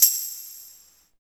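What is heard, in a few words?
98 TAMB+VERB, tambourine + reverb; East West - Phat & Phunky CD2; 90's Sample CDs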